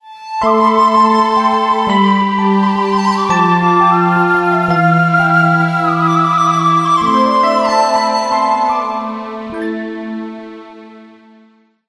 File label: Hero Die